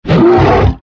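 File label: bear attack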